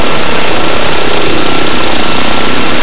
rotor; fgdata

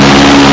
gatling; fgdata

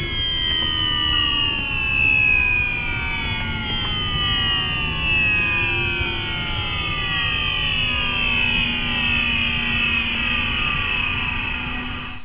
starter stop; fgdata

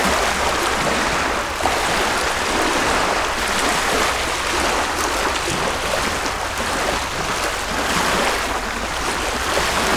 water fast; fgdata

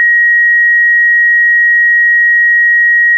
stall; fgdata

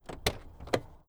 door opening, c172p; fgdata

